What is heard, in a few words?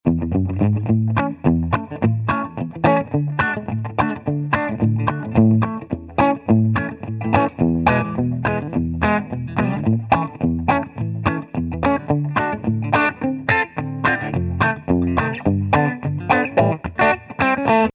Outdoors Positive Loop electric guitar